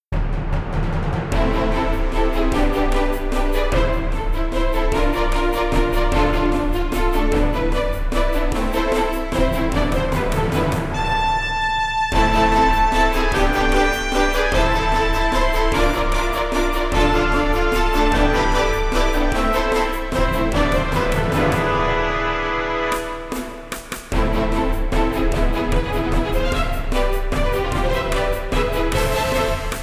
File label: Battle